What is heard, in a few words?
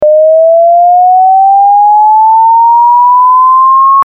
Whoop